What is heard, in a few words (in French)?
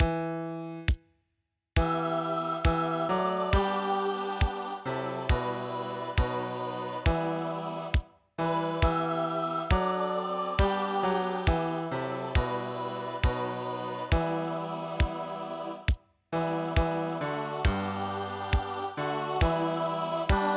bas avec